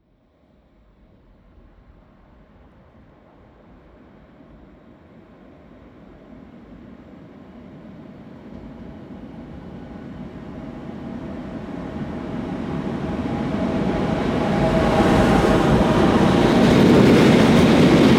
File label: train freight drive by fast